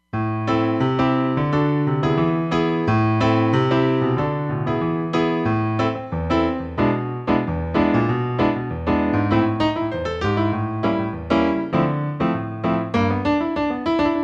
Bright Tune, Roland Fantom XR

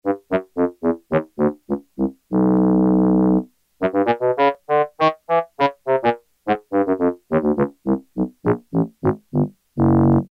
Bassoon, Yamaha TX81Z

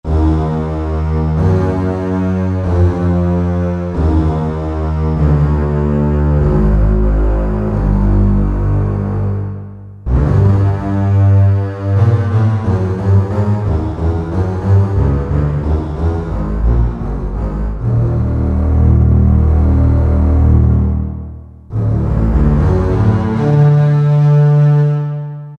Wide Basses, Orchestral

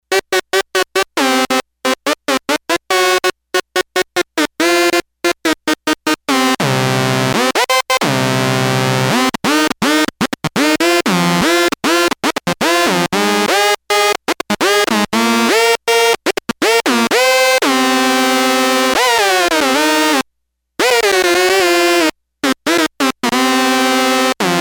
Supersaw Acid House, Arturia MicroBrute; Factory patch sheets

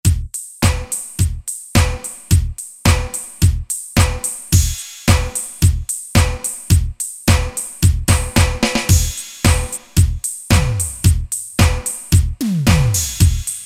DANCE, Factory Rhythm Sets; Roland SC-880

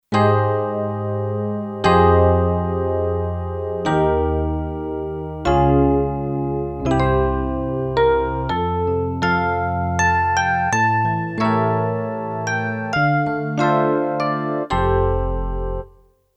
E Piano, Kurzweil K2500X; Factory Programs

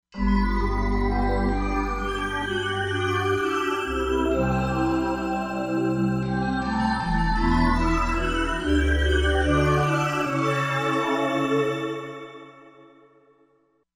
Star Chiff, Korg MSC-07S Synth; Korg MSC-07S Synth 2

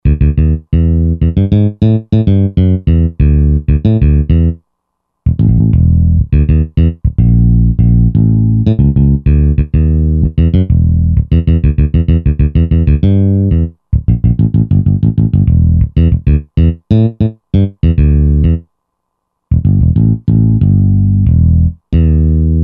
Finger Bass, Roland JV-2080

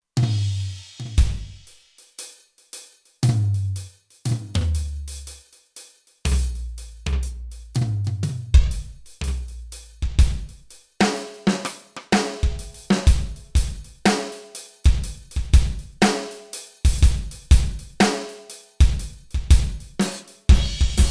Rock Kit, Kurzweil K2500X; Factory Programs

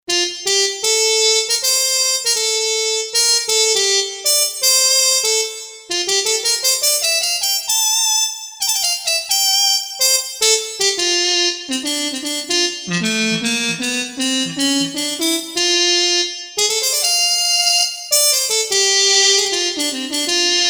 Mute TP mod, Roland JV-880; Factory Patches

